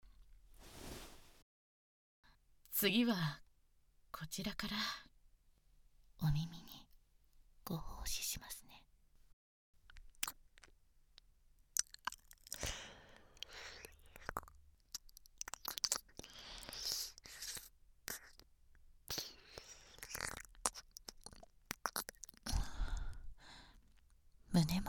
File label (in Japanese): 密着 吐息が当たる えっち 耳舐め